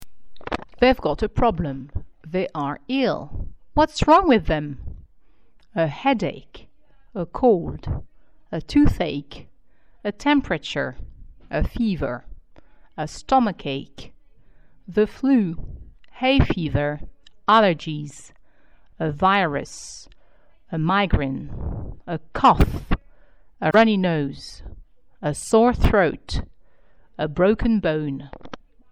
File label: wrong